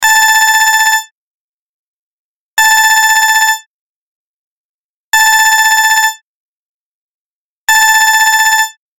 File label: ring